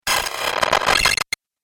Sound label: Game Glitch Effect, Video Game Sound Effects